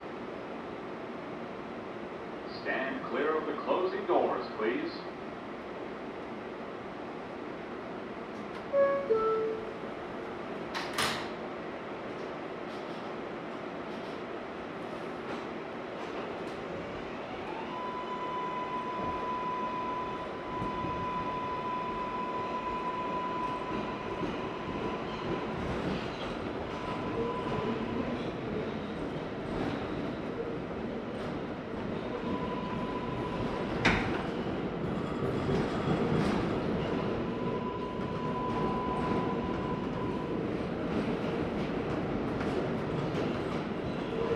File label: INT NYC Subway Train Empty Ride Between Stations, Vehicles; AMBISONICS